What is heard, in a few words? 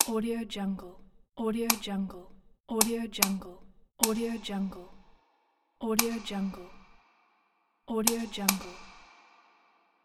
light switch